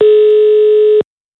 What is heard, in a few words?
ringback; in call manager; ios; humand mobile